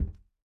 step shoes, footstep

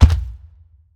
step, alien

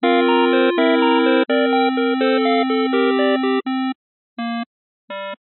fail; VOREStation; arcade

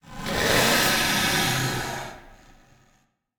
gnarl, alien voice

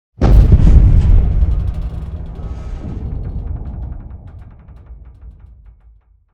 explosion creak

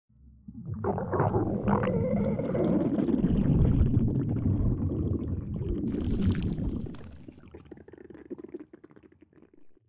death

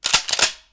shotgun pump, weapons

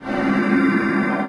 curse

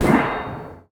clang

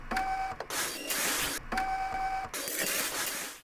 destructive analyzer, machines; Polaris; Ambience and Sound Overhaul

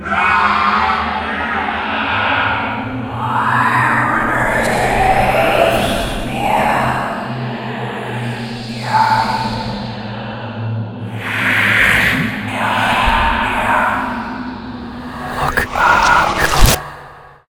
legion spawn, creatures

